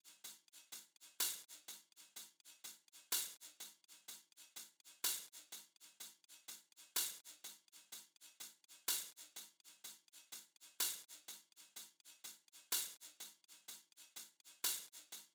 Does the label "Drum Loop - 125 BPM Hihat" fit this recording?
Yes